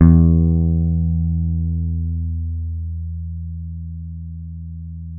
E 4 JAZZ GTR; Univers Sons; Jazzistic

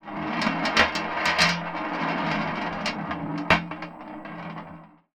Tin Tray, Marbles, contact mic; musicradar; contact mic madness; Raw

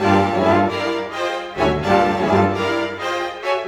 Orchestra, Rock Pop

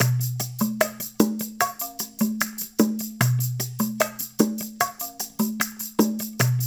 loop, percussion; InVision Interactive

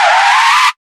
Car-Stop; Roland BK-5

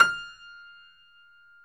F5 YAMAHA, GRAND; NS GRAND

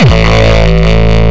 Longkick, kicks

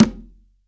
CONGA, STEREO CONGA

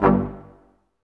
BRS Quintet stac, Symphony Orchestra; Roland LCDP08

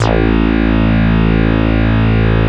XFM BS, FM Syn Bass, Synth Bass

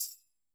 TAM HIT, PERC; Sampleheads; NYC Percussionworks; SF PERC VOL1

